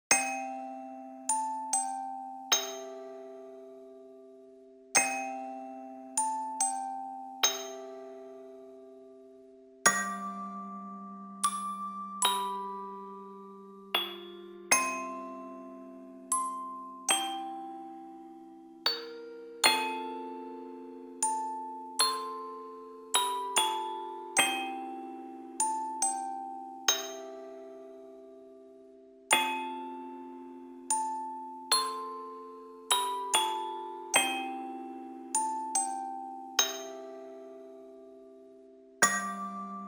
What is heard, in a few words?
Music Box